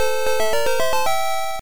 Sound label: Level Up (Compile Jingle); The Guardian Legend